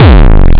kicks, Hardcore

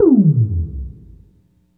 Zap

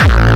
bombo, kicks, Hardcore

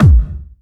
Hard Kick

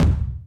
kicks, Bass Drum

